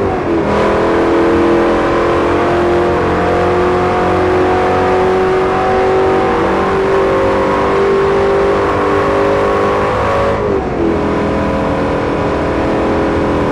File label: fourth cruise, vehicles cars bugatti; tdmcars